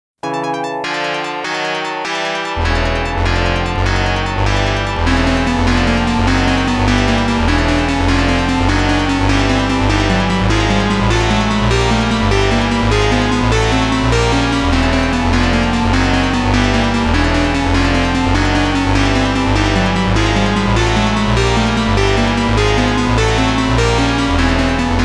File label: Stage 9 Boss BGM; Gun Smoke